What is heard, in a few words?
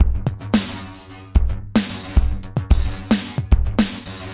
111 tripzap functionizer, drum loops